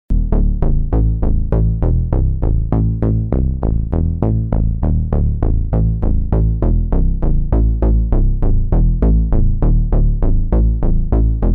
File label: bass